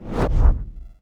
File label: zoom in